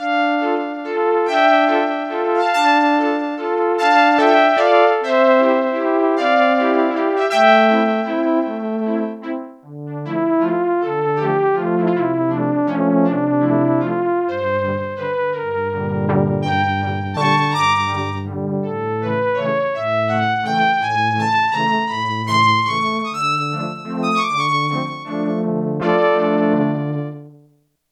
Bright Bow, Roland JX-8P

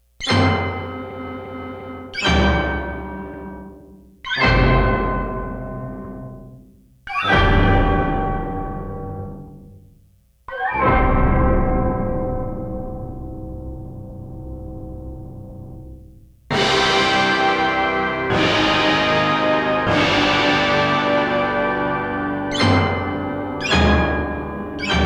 HIT ORCH HIT II; IGORS HIT ORCH HIT II

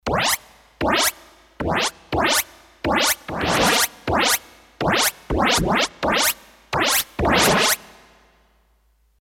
Bubble Perc, Roland D-10; Factory Patches

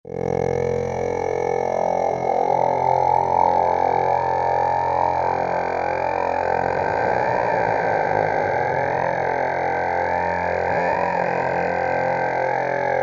Tuvan Lows, Roland V-Synth; Factory Patches

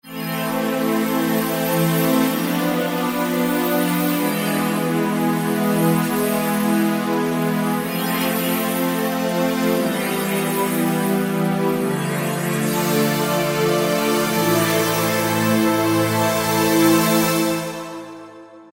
Pd Orion, Yamaha EX5R; Factory presets

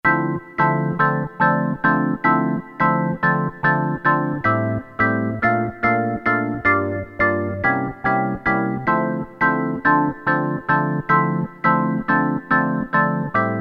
Organ, Korg DSS-1 DWGS synthesizer presets; Korg DSS-1 Sound Library

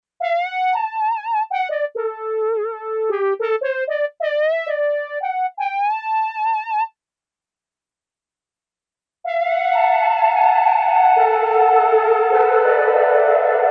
5 Sec. LARGE WARM, Alesis MidiVerb

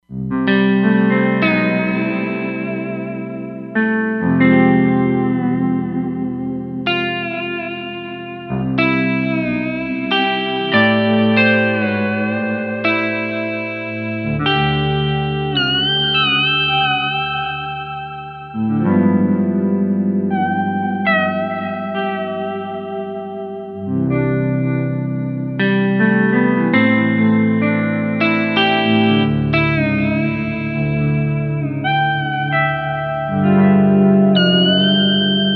Pedal Steel, Roland Fantom XR

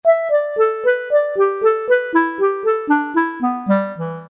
CLARINET; Roland SN-U110-06

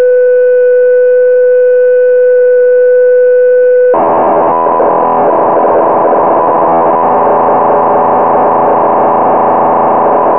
8bit 5.5kHz; factory sounds B

